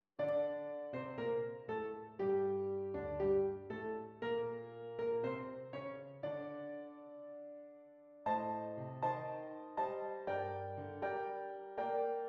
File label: Tango